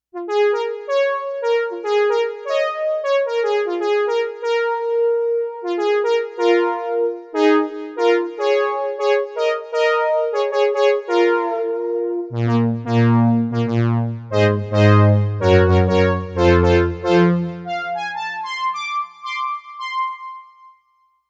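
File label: Lead HORN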